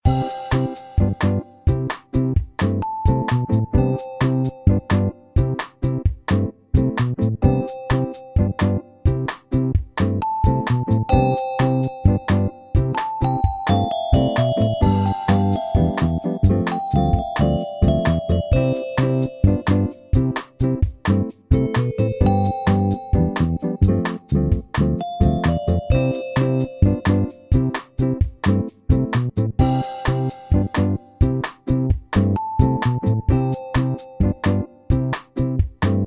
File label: Music Shop; Gun Rounds